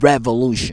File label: VOX, Singin